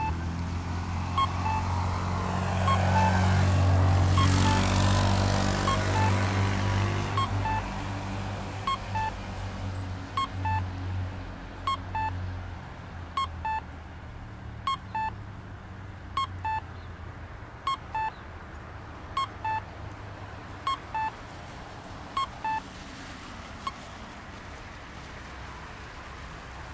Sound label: pedestrian crossing, Salt Lake City, the cuckoo; vertical streets